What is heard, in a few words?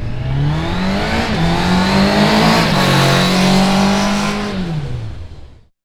Speed Bike Rev By; Bikes & Motorcycles; Vehicles